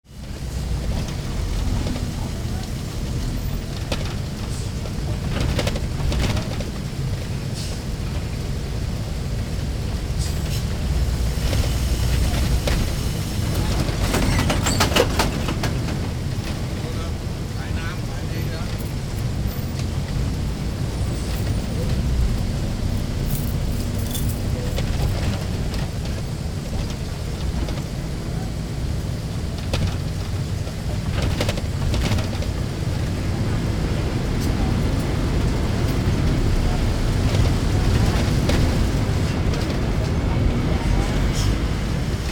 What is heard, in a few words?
BUS